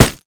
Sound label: default impact bullet; physics; surfaces; Counter-Strike Global Offensive